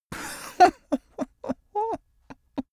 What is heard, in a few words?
male giggle ha